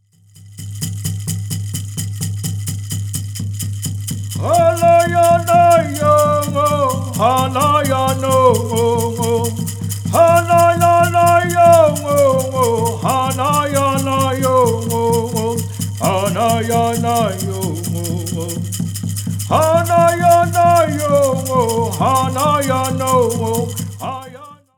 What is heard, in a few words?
Apache Peyote Song